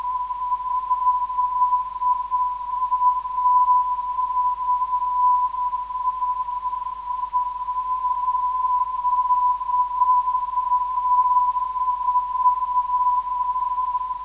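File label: 341kHz, non-directional beacon; LAU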